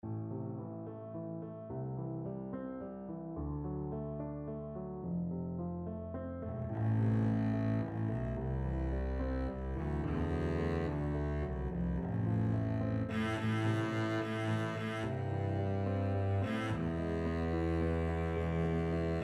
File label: house of the rising sun, Double Bass